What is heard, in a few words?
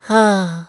sigh aaa